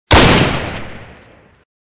Colt45; weapon

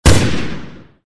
UZI; weapon

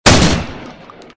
Combat Shotgun; weapon

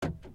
car unlock inside

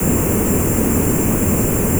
turbo, engine sound

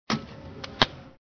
atm insert

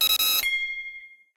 invite

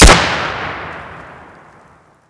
Remington M700; weapon

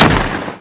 Remington 870 Tactical Express; weapon

